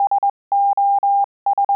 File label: fade in, morse